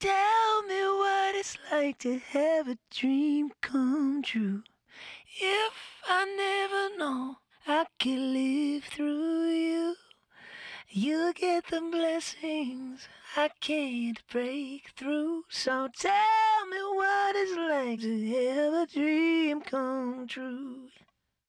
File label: Dry Vocals Collage